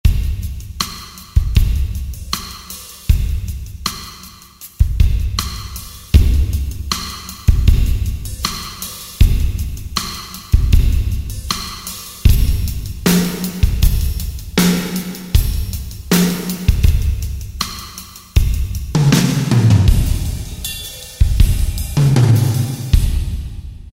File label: Jazz, Drumscapes; Northstar Productions Drumscapes Vol.1